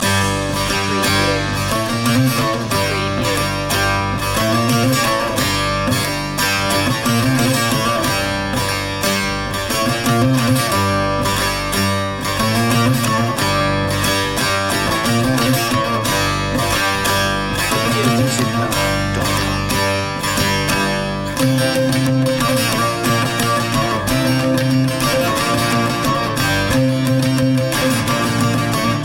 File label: eastern ceremony loop